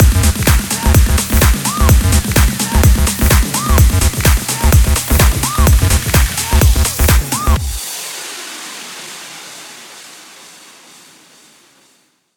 Msc Scifi Scooterama Outro

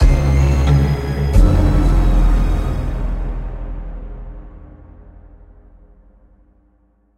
Wraith Loss

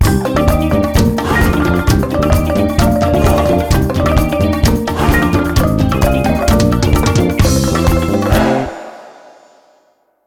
Game Over; Donkey Kong; Punch-Out; Wii

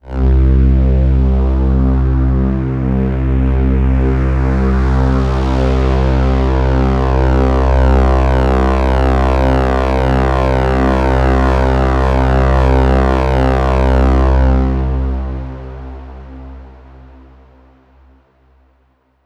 Downtown Overlay Drone

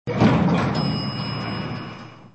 elevator sound

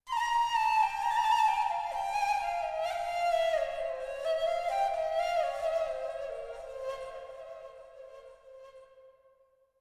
Orient Flute; rise of venice windows gamerip 2013

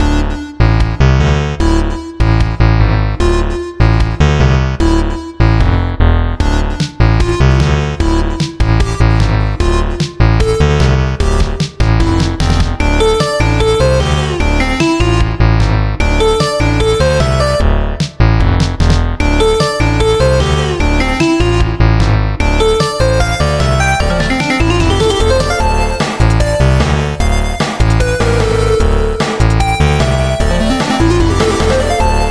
cave 150 bpm; super stone legacy

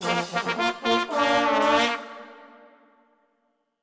End Stinger; Gopher Sidequest

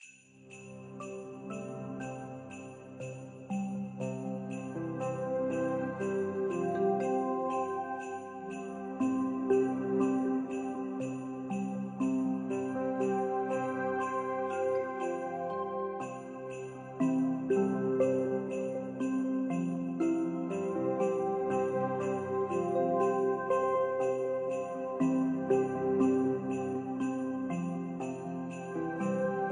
Festive Theme

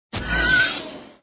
Warp Gate; Blox Forever

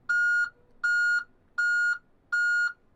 vehicle reverse beep loop

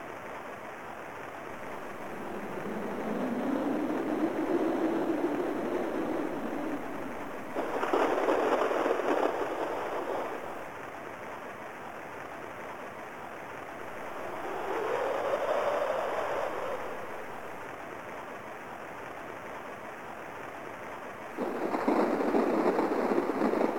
Dark Ambience; Piglet's Big Game; GBA

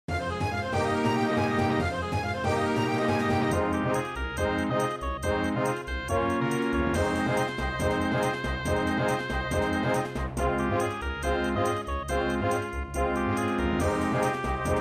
MIDI Battle; Star Merc

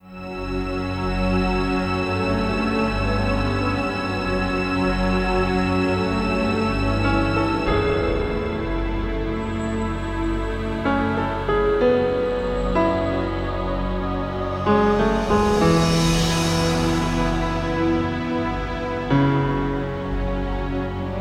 Game Complete; fatal frame 20th anniversary celebration soundtrack

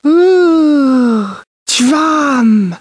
Sound drum

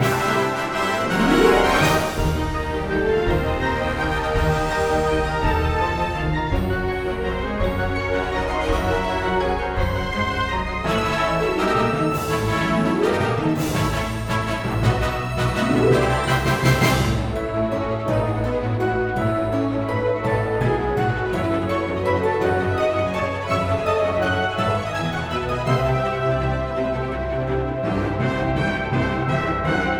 Multiplayer Victory

